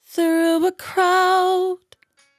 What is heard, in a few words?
100bpm Bm, Velvet Vocal; Zero-G